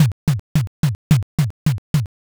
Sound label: bon taiko